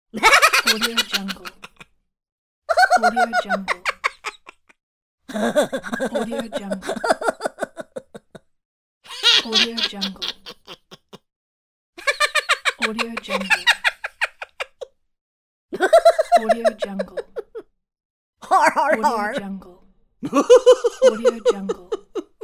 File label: funny cartoon laughs